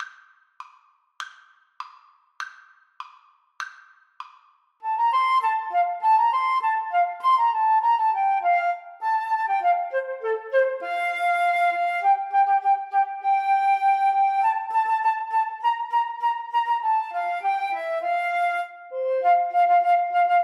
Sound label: Flute duet